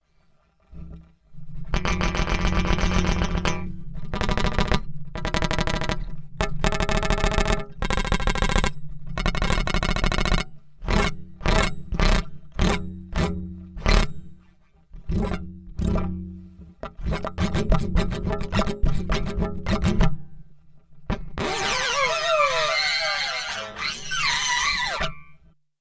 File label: transients; WOLA; wet + dry mix (equal rms); Real-Time Polyphonic Octave Doubling for the Guitar